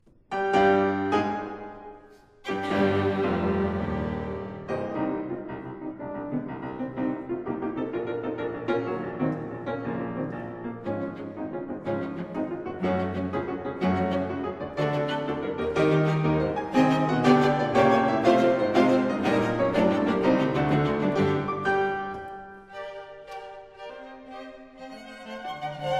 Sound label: Piano Quartet No.3 c-moll; Brahms; Op.60